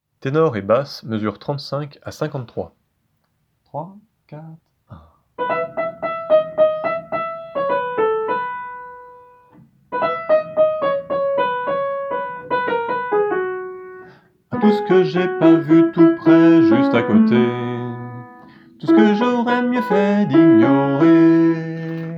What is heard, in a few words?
Tenor et Basse